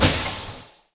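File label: Piston Projection Gas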